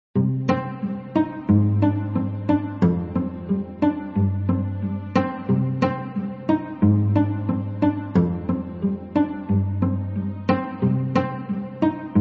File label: Waves 90bpm lofi